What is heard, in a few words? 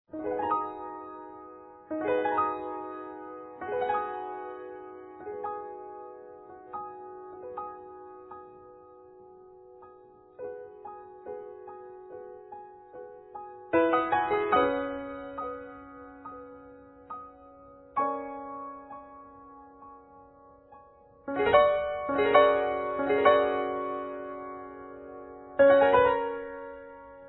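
Forte Piano, "August Forster" Grand Piano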